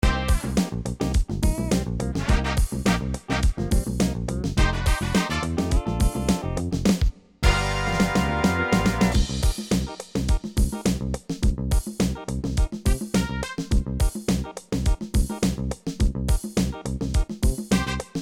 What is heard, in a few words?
funk